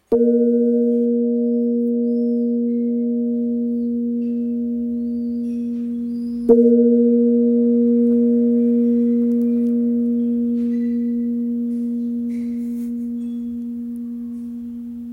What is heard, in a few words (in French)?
Gong birman La diese 2kg